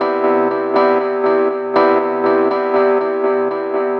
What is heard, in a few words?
Sustained Rhodes; electro piano